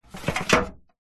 office plastic file drawer tray slide open or close